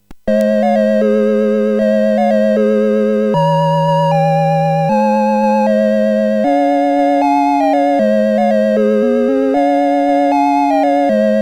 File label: Silent Night, CSG8580R5